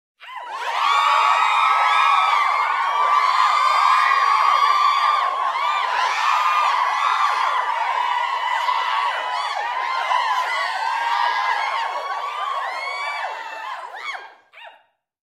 tieng dam dong vui mung la het

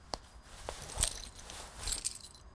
leng keng lấy chìa khóa ra khỏi túi áo quần

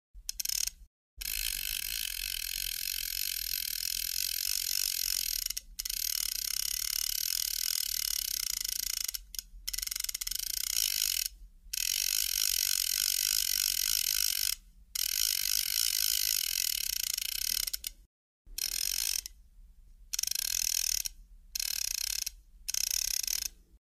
tieng quay cuon day cau ca

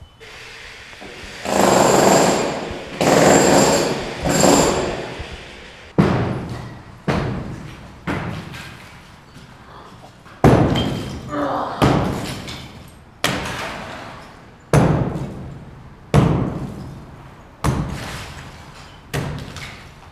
tieng khoan dap pha xay dung trong nha

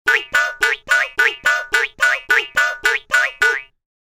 tieng tut tu tut tu hoat hinh lien tuc

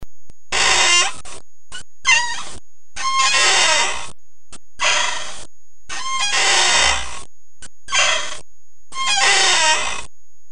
tieng cua so keu cot ket khi bi gio thoi

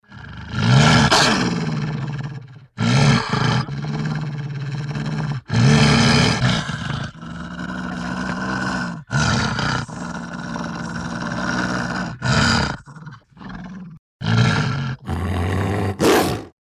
tieng soi gam gu

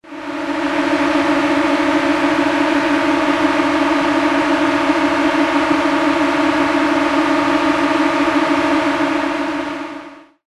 am thanh cua mot dan ong vo ve

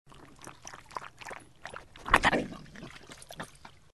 con chó uống nước

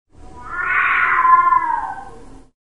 con meo keu meo meo o phong khac

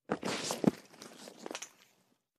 xach balo len cam nhat tui xach len

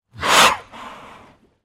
am thanh cua mot con ca heo tho ra mot luong khong khi manh

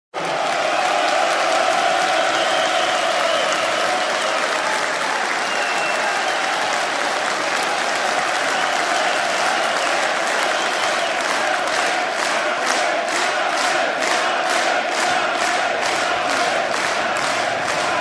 âm thanh vỗ tay trong hội trường sân khấu lớn

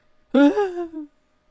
tieng ren hoang so giong dan ong

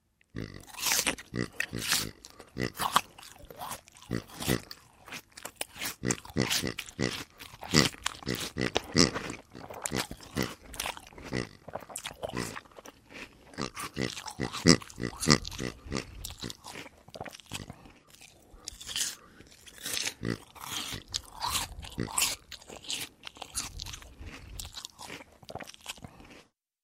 con lợn ăn một quả táo và húp xì xụp

